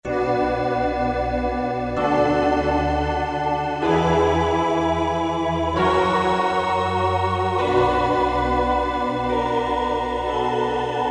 Alpha Omega Enseml, Roland PN-D50; Roland PN-D50-03

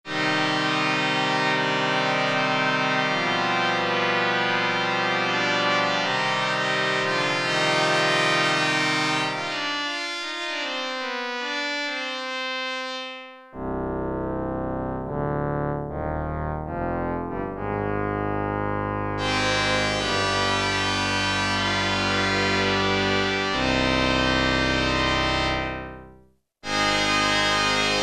CATHEDRAL ORGAN, Oberheim OB-8; Factory Patches

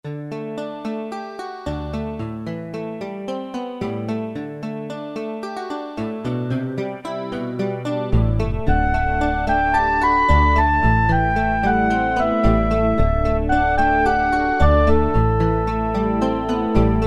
Folk

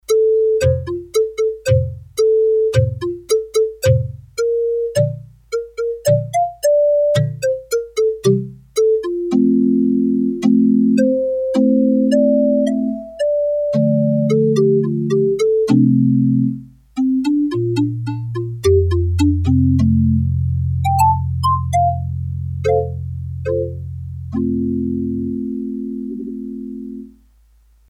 Synth, Korg DS-8; Factory Patches

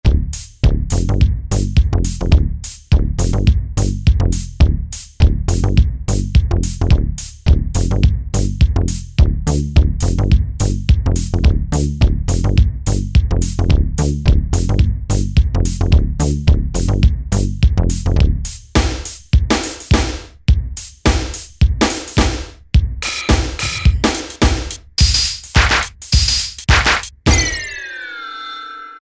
Dance Club, Factory ROM kits

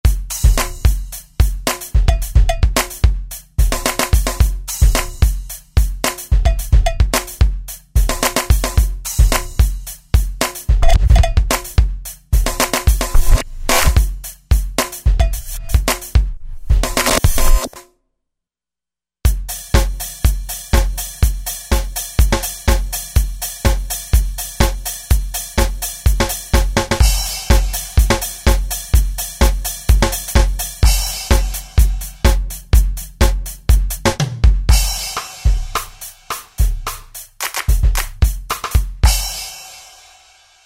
Disco KIT, Roland V-Synth; Factory Patches

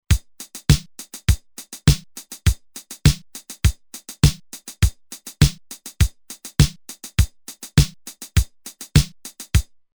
Electric Pop, Roland TR-505; Factory Rhythms

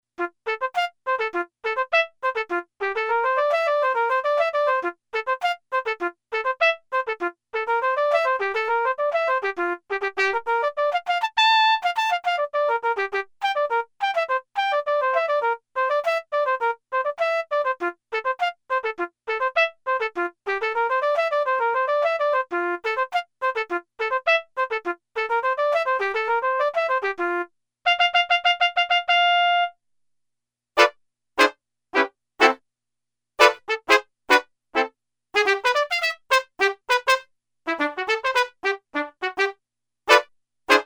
Flugel Horn song

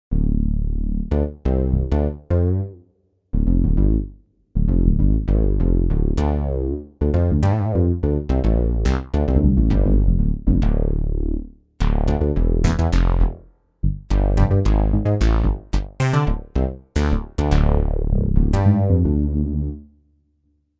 Rubber Bs, Roland JV-880; Factory Patches

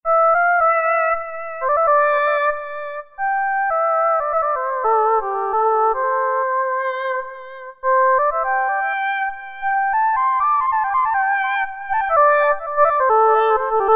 Roland JV-2080

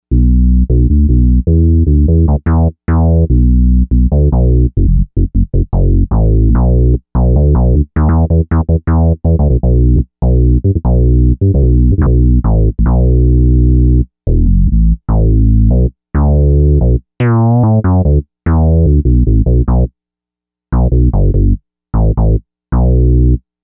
Filta Bass, Novation UltraNova; Factory Patches

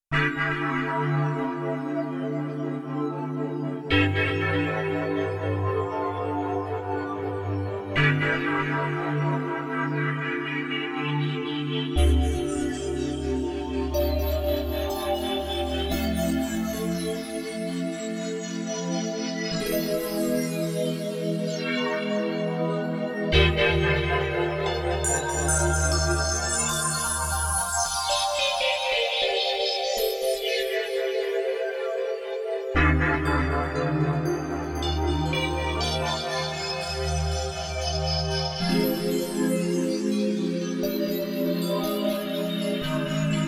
Korg Radias-R

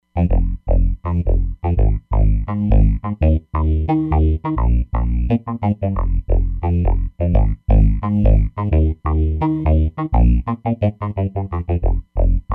Bass

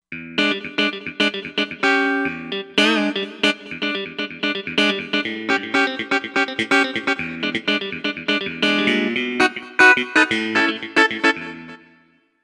Guitar Wah Guitar, synth

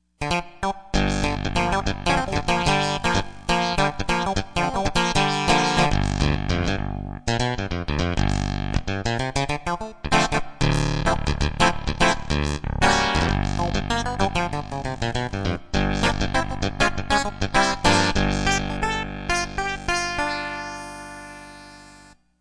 Cry Clav, Yamaha TG55; Factory Presets

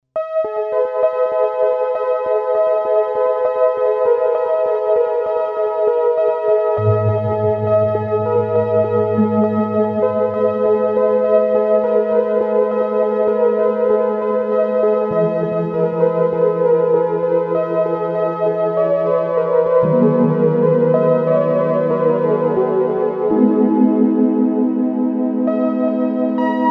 IQ PAD

